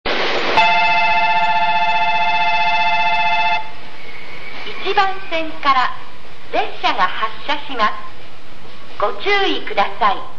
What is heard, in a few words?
bell; kanayama